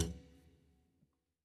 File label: Loop Tail; Good Job